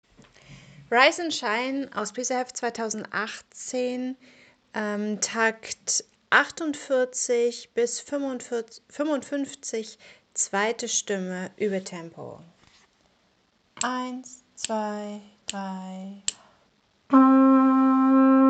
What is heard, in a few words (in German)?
Rise and shine Stimme 2, Posaunenchor; Uebetempo